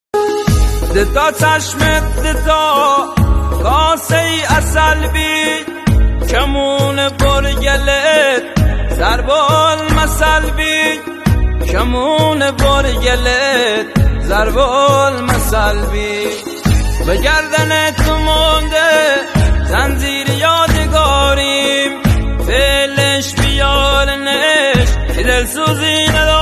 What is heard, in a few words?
lori music